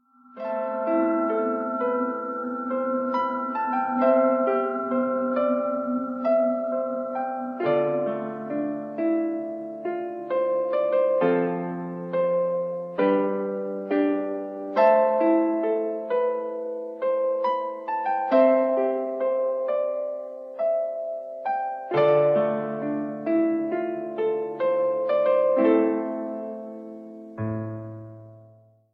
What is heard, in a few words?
Piano Song